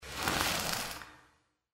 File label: blowtorch whoosh; transitions and movement